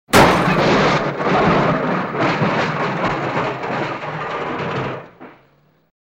udary neskolkih mashin odnovremenno

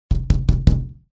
vhodnaja metallicheskaja plotnaja dver

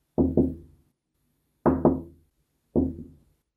bystryj mimoletnyj v kabinet; Звук стука в дверь

